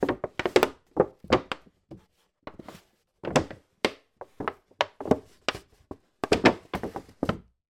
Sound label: foley bottle plastic milk empty squeeze movement